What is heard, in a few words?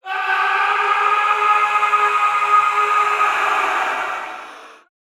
cry out loud